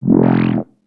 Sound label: bass tweak gross F; Polyend; ARC Noise